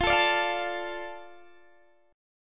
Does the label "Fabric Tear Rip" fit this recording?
No